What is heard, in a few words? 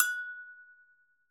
Bells Agogo Hi; One Shots; Drums; Ableton Live 12 Lite; Core Library